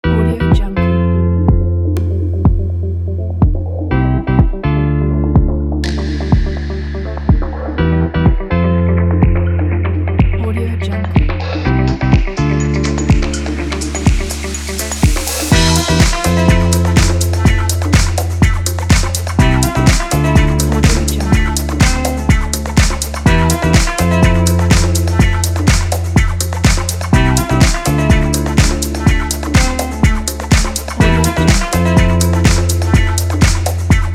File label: summer chillout